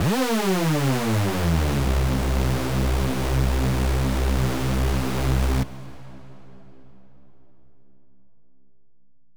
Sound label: Hoover FX, C; House; Various FX